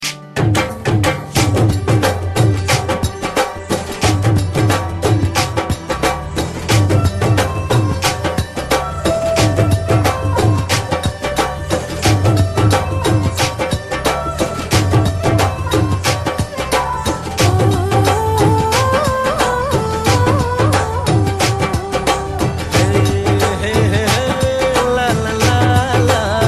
navratri theme; Navratri Special

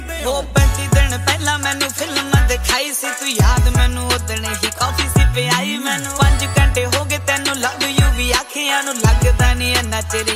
Film Song Ringtone

